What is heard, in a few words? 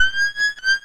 wink